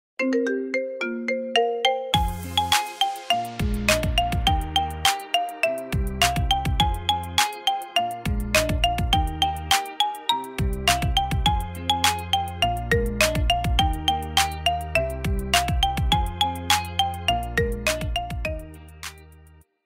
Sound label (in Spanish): Marimba, tono de llamada